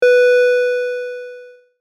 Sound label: triangle beep long